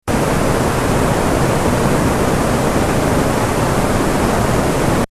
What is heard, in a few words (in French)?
bruit route